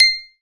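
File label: bell C7, Casio VZ10M; Polyend; Simulacrum Sounds; 1 Shots; Synths; Leads and Keys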